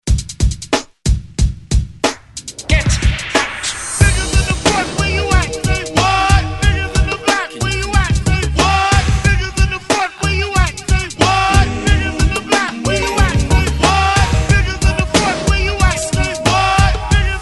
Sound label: 80s Hype ReDrum 91 Bpm; DJDOLLS